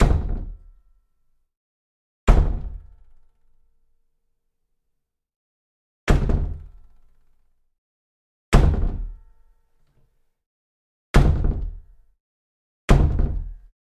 DOOR Creak Wooden Door Door Slams Impacts Creaking Door; RogueWaves